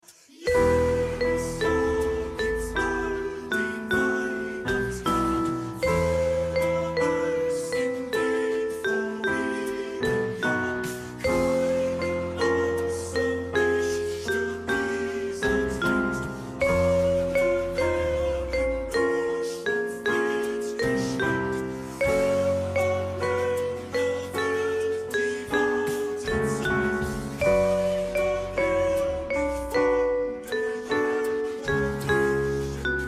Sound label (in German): Sopran Bridge; Em; Adventskalender im September; cantaarte advents und weihnachtslieder 2025